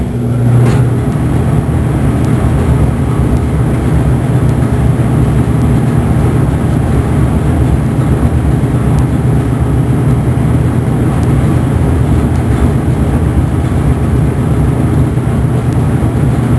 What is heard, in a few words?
Furnace Start and Run